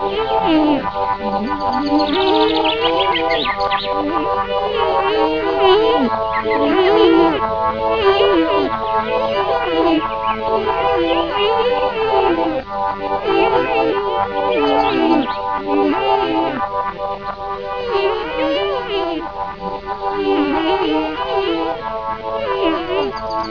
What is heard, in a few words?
buzy bees